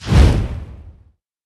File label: phoenix basic attack